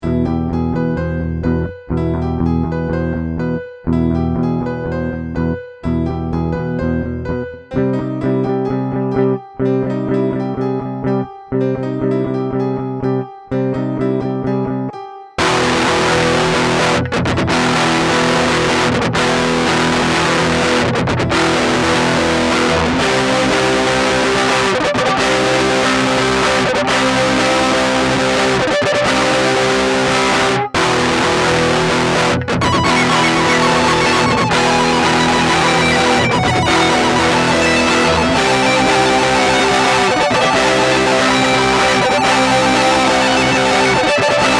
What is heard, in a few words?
Piano Intro